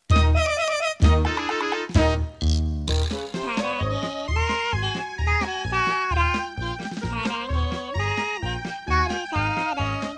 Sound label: Sarangi Funny Song